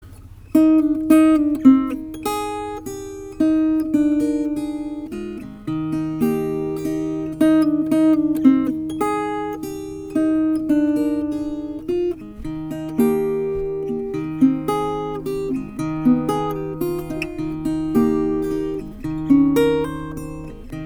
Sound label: guitar minuet